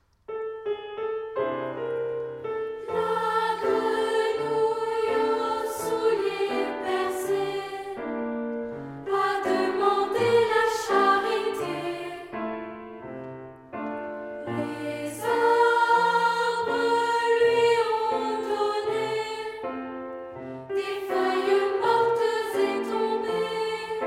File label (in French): tutti; grenouille